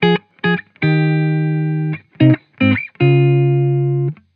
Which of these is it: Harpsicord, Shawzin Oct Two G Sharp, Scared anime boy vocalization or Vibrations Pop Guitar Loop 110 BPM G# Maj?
Vibrations Pop Guitar Loop 110 BPM G# Maj